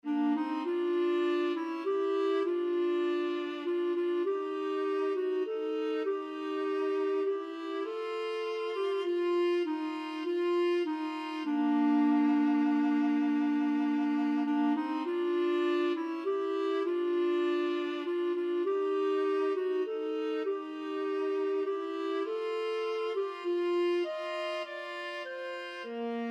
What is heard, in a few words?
rugged cross, clarinet viola duet